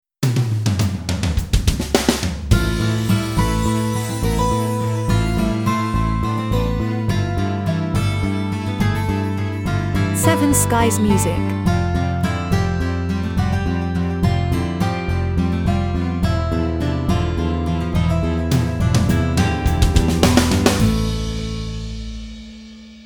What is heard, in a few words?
Parisian Gipsy jingle